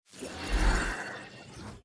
ship engines; World Of Warships

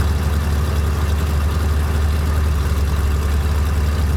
engines; World of Tanks

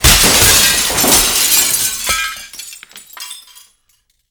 airport skylight glass

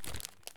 bandage take; Escape from Tarkov

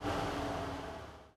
engines; World of Tanks

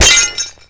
bullet impact plate; weapons; Modern Warfare

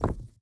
ui drop stone; Rust

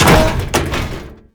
armada latch pull loose

